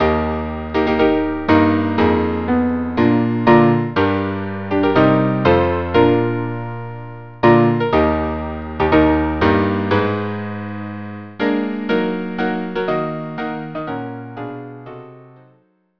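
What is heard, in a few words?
string quartet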